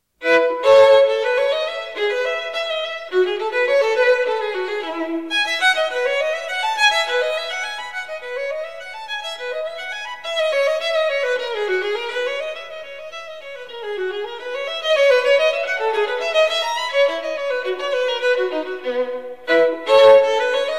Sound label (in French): Partita pour violon seul Bourree; BWV1006; Jean Sebastien Bach